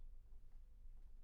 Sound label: Bass Room